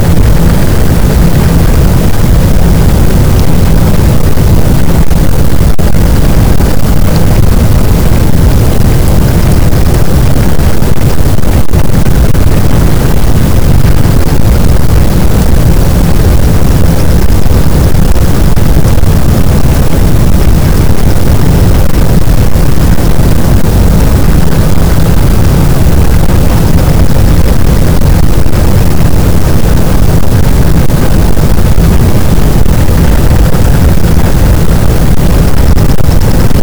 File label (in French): cachalot, Physeter Macrocephalus, gaussian noise